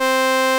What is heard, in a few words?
SOLINA, String Machines